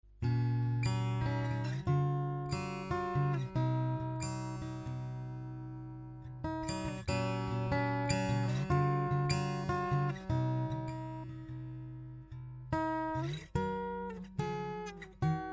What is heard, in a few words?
114ce DI Fingerstyle; LTD